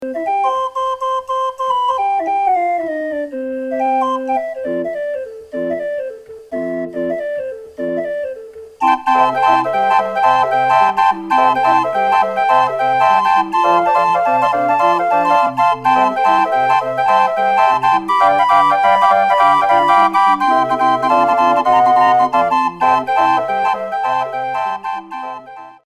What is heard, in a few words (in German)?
konzertorgel , drehorgel